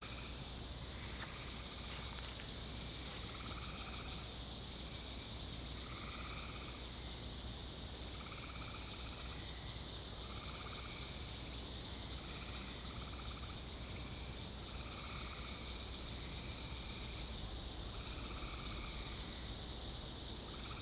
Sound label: Insects Crickets Ambience; sound box house with nature sounds deluxe lavender 15cm